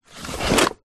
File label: stationery drawer wooden open; office